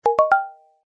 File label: Puzzle game level up